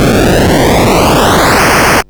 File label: Arcade Riffs